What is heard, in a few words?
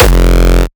kick oneshot monster F; GhostHack